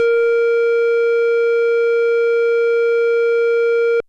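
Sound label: FM Sq Dist DX100 A#1; square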